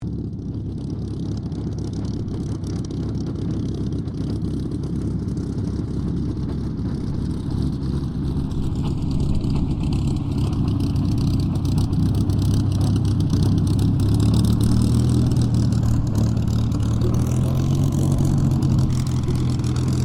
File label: AIRCRAFT SUPERMARINE SPITFIRE MK-XVI TAXI STEREO; Airplanes